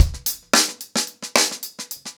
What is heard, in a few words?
Hip Hop 110BPM, acoustic drums drumloops